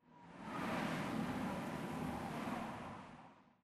eject pilot apex wind; Titanfall 2

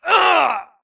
grunt death quick; Titanfall 2

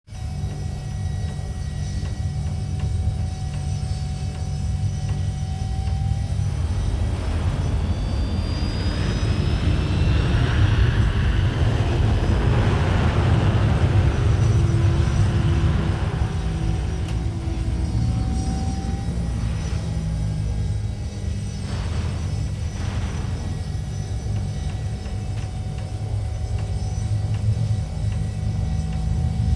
dropship; colony; imc intro; Titanfall 2

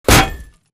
bullet heavyballistic titan; Titanfall 2

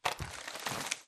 spectre zombie drag grunt; Titanfall 2

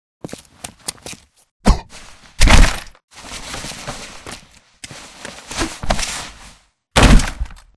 brawl; Titanfall 2

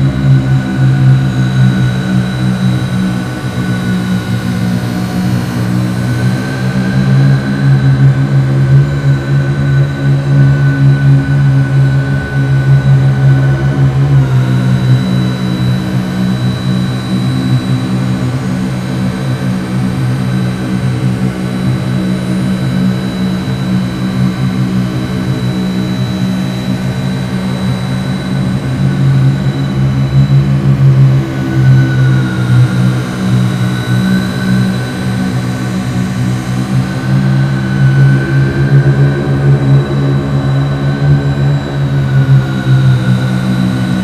giant fan scifi turbine; Titanfall 2

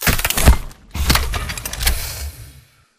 stand reload; Titanfall 2

